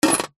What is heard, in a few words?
foley coin down on thin metal surface